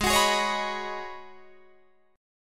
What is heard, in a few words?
Ab Minor 11th, Ab, Guitar, DADGAD